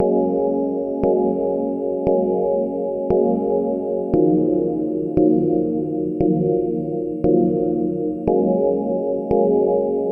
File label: Lounge Ambient Music Loop